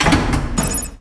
button minor

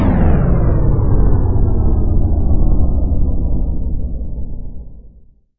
generator out

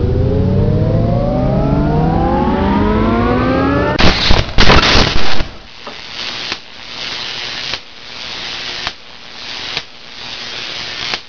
boss electricity

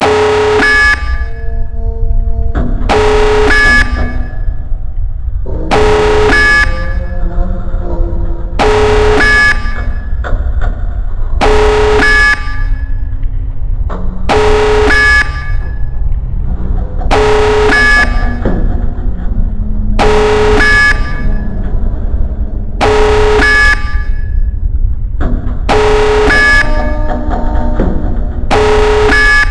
alarm; polarcomplex

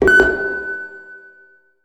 enemy flag drop

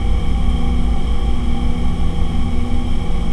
interior

